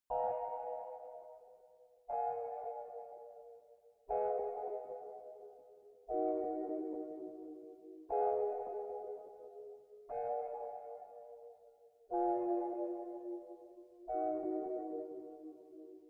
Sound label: 120 BPM D# Min Pitched Pad; Loop Stems; Cymatics; 2022 Melody Mystery Expansion; Slowly Drifting